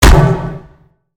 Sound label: Robot Get Hit Dry; Lego; aronwk collection; ndaudio; creatures mechanical